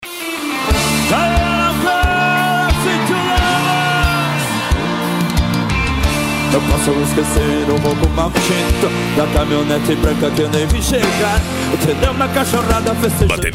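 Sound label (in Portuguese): especial sertanejo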